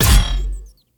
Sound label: water cannon